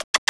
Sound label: whistle; CATZ; KITTENZ